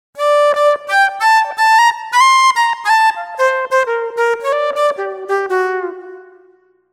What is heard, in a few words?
Aqua Flut; TURBO VL